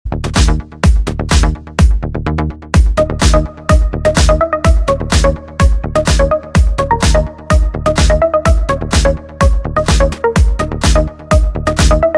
Minimal

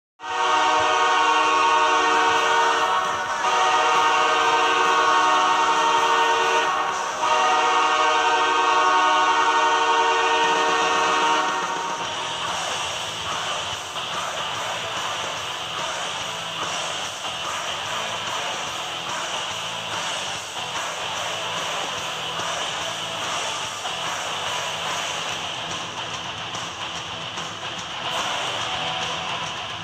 Goal Horn; LA Kings

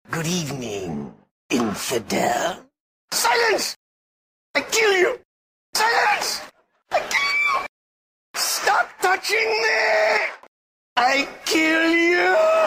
Ringtone; Terrorist

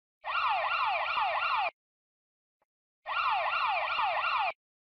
Ambulance alert

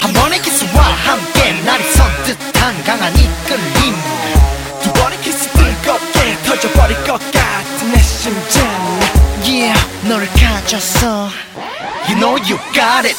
rap

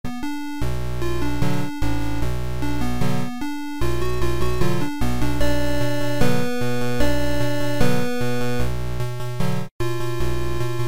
8-bit; Punisher

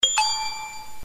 Sms drop

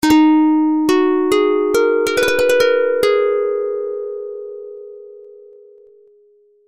Sms Tune

